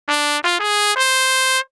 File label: phrase norm, trumpet; samplemodeling